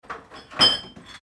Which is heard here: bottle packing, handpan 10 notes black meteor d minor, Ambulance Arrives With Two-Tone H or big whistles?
bottle packing